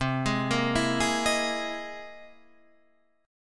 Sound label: C 7th Sharp 5th Sharp 9th, C